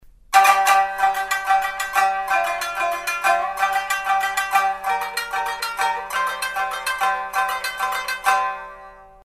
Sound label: shamisen